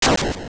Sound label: Barrier; Sounds of various barriers appearing or disappearing (usually repeats 4 times) (also dungeon walls shifting; Thwomp landing; Zelda Oracle